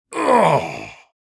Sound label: Senior male pain vocalization